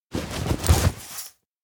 seasoning show; anemoi

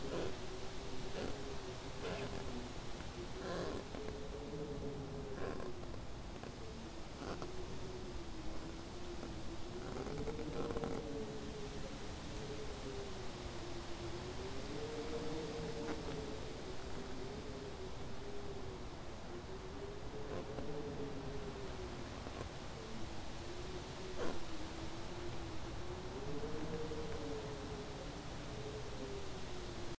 outdoor old ranch quiet creak

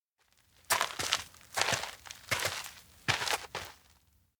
steps indoor loghouse leave; doctor; anemoi パッケージ版 1.1

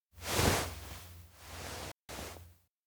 nod slow; anemoi

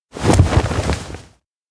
snow kneel; anemoi

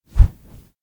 bear suit wave; awayuki

